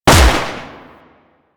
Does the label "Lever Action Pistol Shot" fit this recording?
Yes